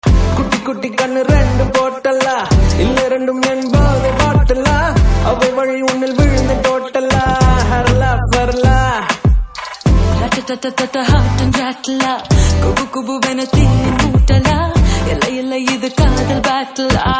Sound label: Ringtone; Vishal Chakra Movie; Tamil Ringtones